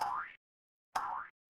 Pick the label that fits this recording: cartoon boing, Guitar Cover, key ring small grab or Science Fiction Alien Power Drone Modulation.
cartoon boing